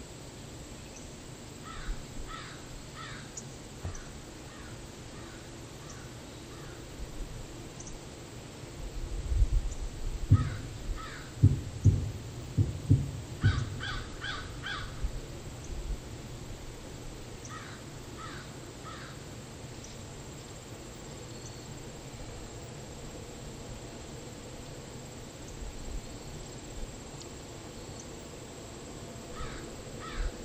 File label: Anomalous Environmental Sounds